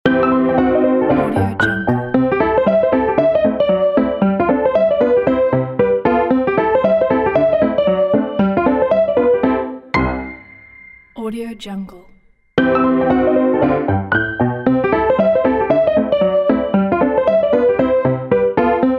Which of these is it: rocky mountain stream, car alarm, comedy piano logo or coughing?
comedy piano logo